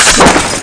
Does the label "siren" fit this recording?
Yes